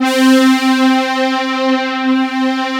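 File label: analog pad C4; ANALOGY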